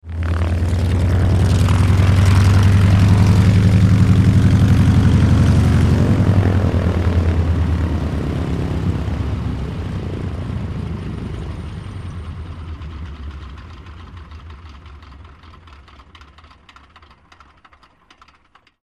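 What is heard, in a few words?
Taylorcraft Throttle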